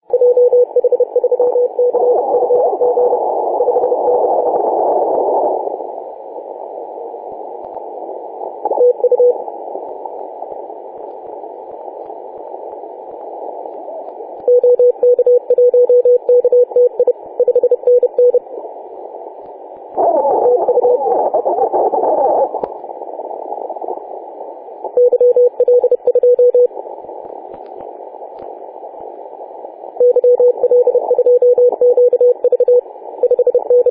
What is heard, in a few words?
28.010 MHz CW